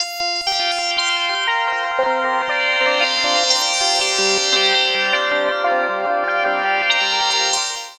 Synth, Synth Textures

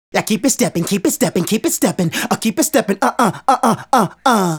Male Solo, Hip-Hop and RnB Vocals

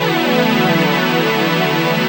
Physical Pad, Jungle; M8

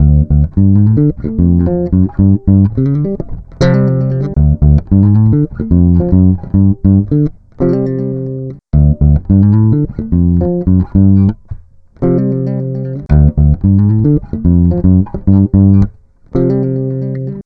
Bass, Electro Lounge; Sonic Foundry (Sony Creative Software); 110 Strictly Legit

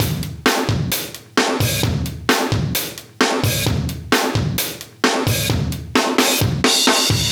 131 Beat, breaks

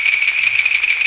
RATTLE, JAPANESE

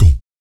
VOC PERC, Vocal Planet Jazz FX BASS PERC

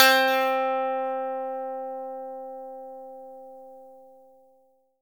E-GITARRE, Alpine Volksmusik; Best Service

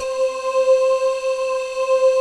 PADS; Pro-Rec - Synthsonic; TR PADS

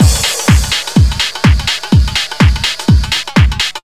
glandular, Deep House 125bpm

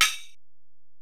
Ac Perc, Drum Machines Alesis DM-PRO

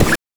BANK2 SFX, NES DPCM; MIDINES

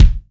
FUNK KICK

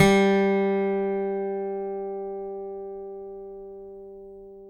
ACGT F GOP, ACCGTR PICK, Country

